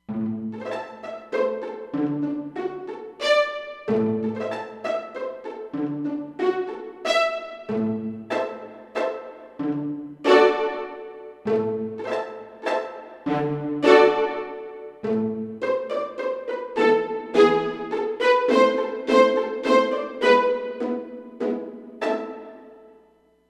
Pizz Stac

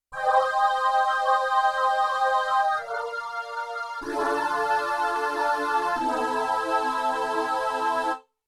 Filtermodulation; Yamaha TX16W; Orchestral Performance 1

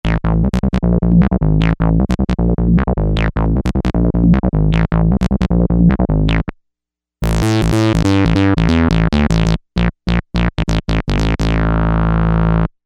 AFX bAss

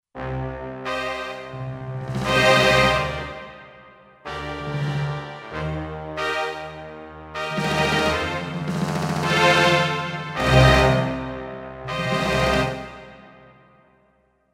Press Roll Orch

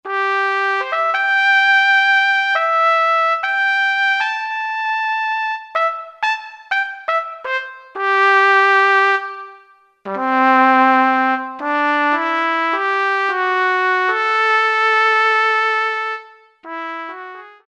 Orch Trumpet; Kurzweil K2000 Orchestral ROM

